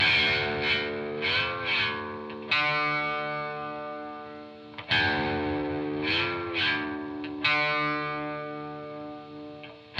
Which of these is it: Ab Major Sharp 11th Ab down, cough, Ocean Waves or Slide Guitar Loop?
Slide Guitar Loop